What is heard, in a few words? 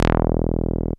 Bass Long E; VEC1; Club; Long Basses